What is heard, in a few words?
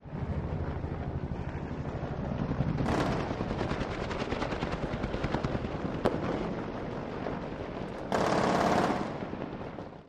Helo Assault, heli; Helicopter Assault; Heli FX